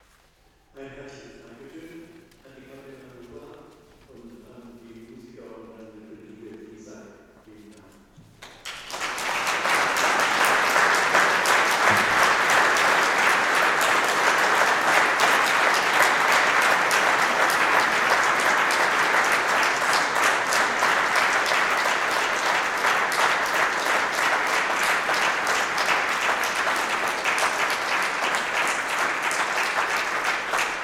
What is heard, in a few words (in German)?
dank und applaus